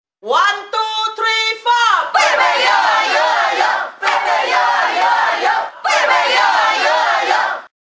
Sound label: 881 Cheer